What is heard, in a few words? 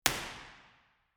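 impulse response, St Andrews church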